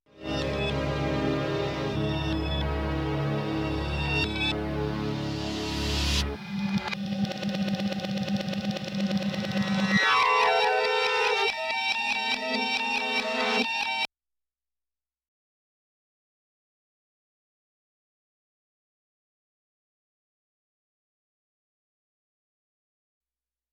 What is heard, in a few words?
stepping drum; halloween